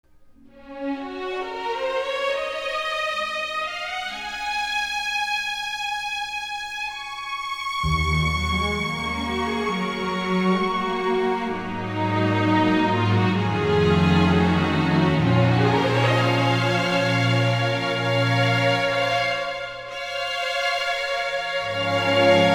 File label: strings